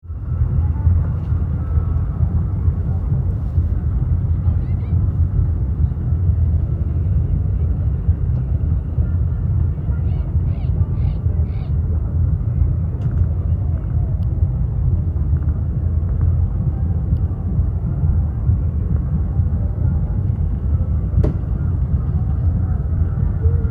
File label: playa at night